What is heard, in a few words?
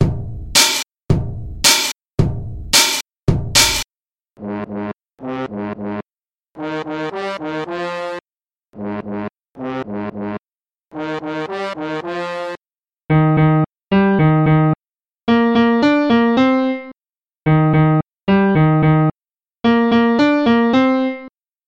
No Vocals